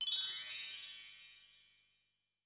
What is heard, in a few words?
Marc tree, percussion; MARKYDRUMS